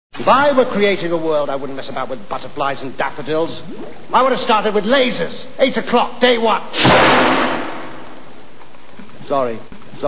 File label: lasers